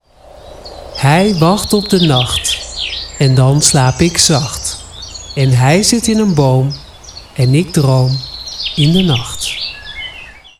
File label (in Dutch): Bosuil